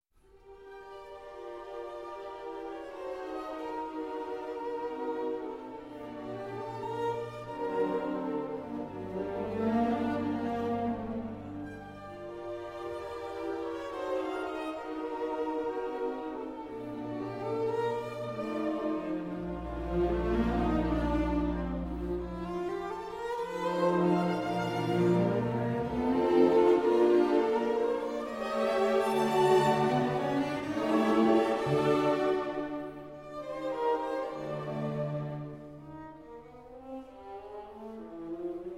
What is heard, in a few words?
String Quartet, Romance - Andante Espressivo in G Minor